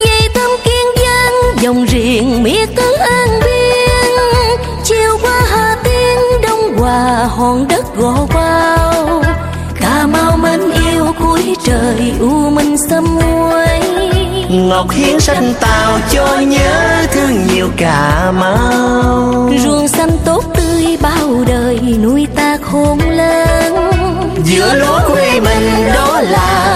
vietnamese ringtone

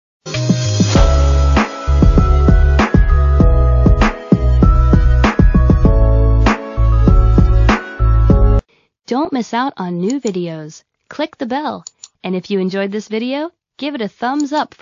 taiwan most popular ringtone

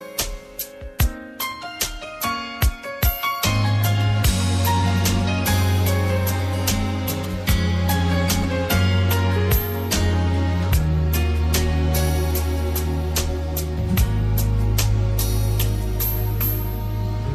ringtone

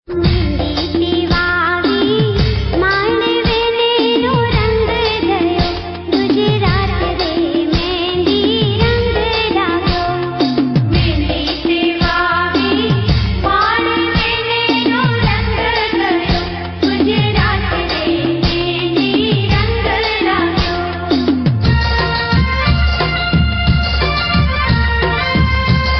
gujarati ringtone

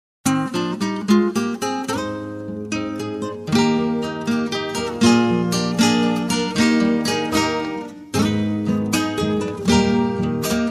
argentina folk ringtone

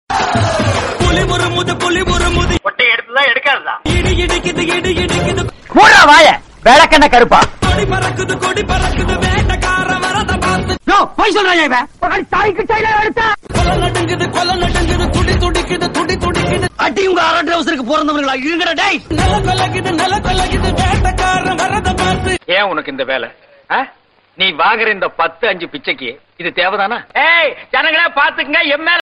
remix

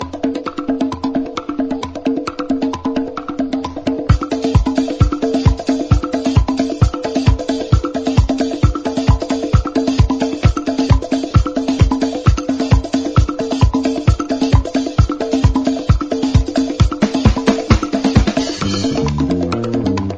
african dance ringtone

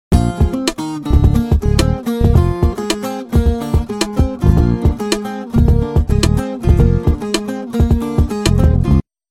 latin remix ringtone

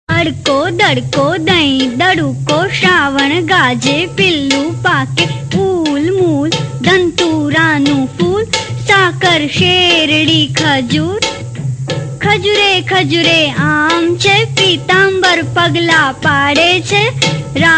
gujarati ringtone

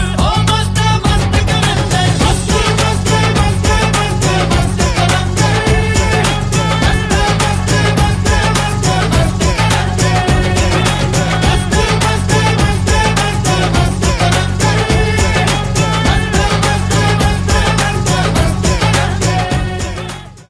hindi film song, ringtone; heyy babyy

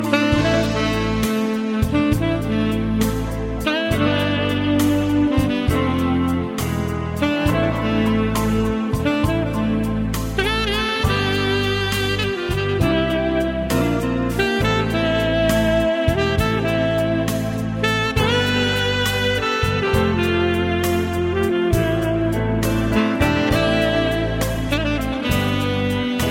spanish ringtone

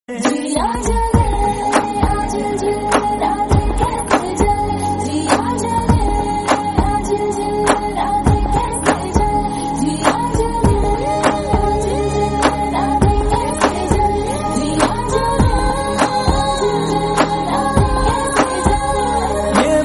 telugu ringtone

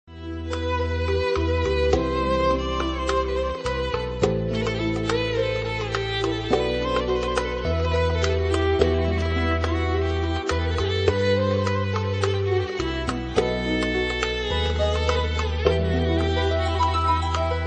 slow romantic ringtone

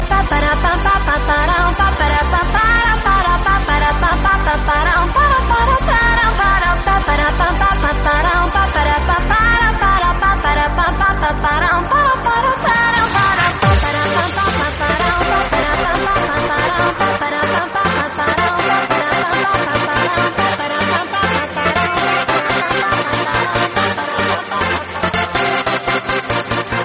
funk

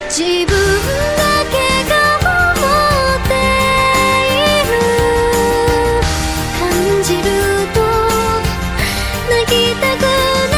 nightcore japanese ringtone

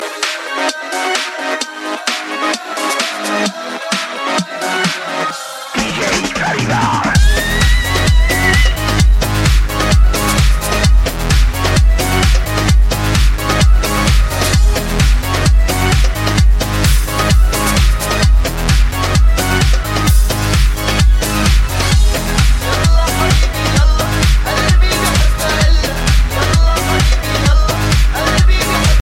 arabic dj

